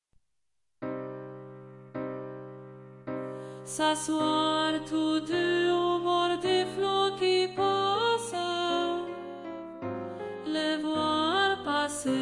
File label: soprano; Au bord de l'eau; Gabriel Fauré